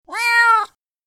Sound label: Cat Meow Sound; میوی گربه; gorbe